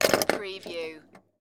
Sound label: Pinball sound, ball move and hit metal objects